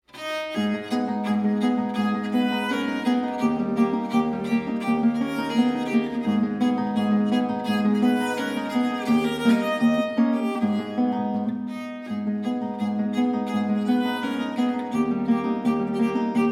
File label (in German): Arpeggione Moderato a Moll